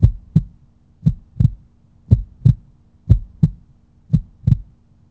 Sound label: heart; The Elder Scrolls III Morrowind GOTY; XBOX